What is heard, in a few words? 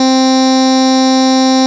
bass, SQ80